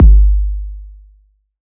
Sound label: Kick; Ableton Live 12 Lite; Core Library; Drums